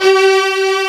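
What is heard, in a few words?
Kurzweil C5, strings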